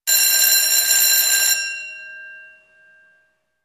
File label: Short, Rapid, School Bell Ring ( Could Be An Alarm, Old Phone Ring ); chimes & bells